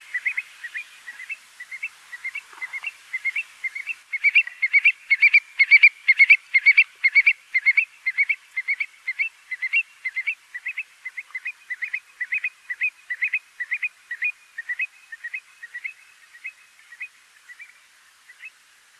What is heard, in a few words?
call, Stone curlew, gallop call; bisyllabic variant; Burhinus oedicnemus